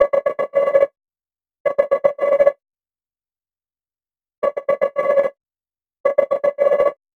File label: crossing, ringtones; Nothing Phone (2)